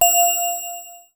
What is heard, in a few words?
BELL